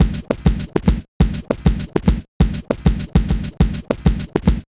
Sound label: Vinyl Scratch Kick; Digidesign; Pro Tools; Pro Tools Essential Loops; Dance DJ